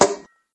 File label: toybrick